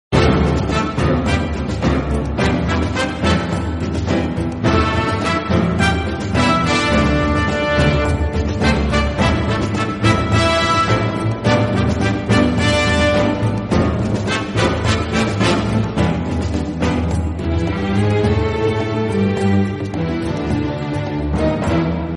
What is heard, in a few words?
remix english; One Piece